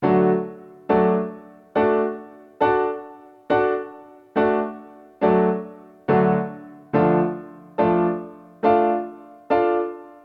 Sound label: triad and inversion block chord in D and Dm